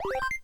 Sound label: win